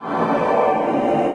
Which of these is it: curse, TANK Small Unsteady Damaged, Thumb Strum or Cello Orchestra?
curse